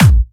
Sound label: Bassdrums Clubby Kicks; Vengeance Samples